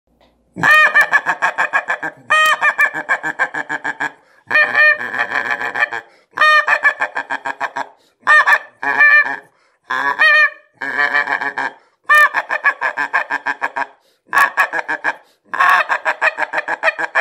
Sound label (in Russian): серый гусь